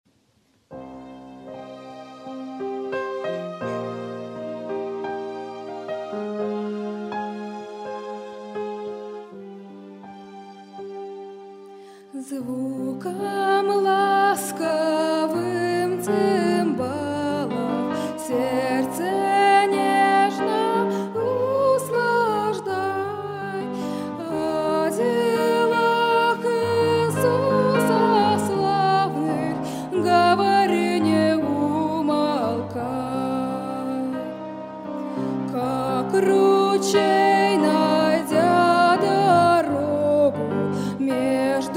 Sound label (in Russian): пение Звукам ласковым цымбала; 2017 Апшеронск, Подростковый лагерь